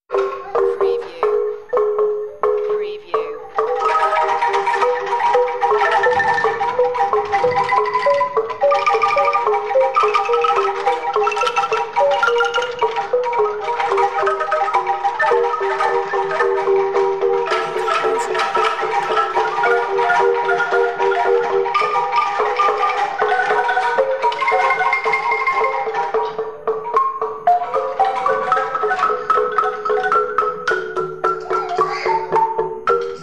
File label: Angklung Eastern Ambience